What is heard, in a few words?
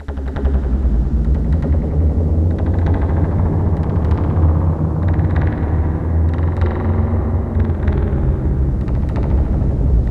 Dark Ambient BBD; Pico BBD